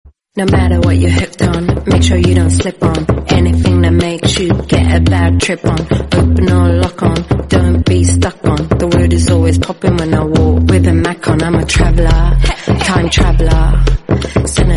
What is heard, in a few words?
English Ringtone